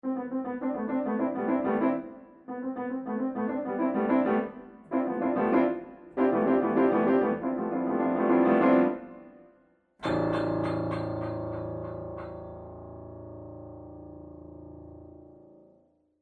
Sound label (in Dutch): witte toetsen clusters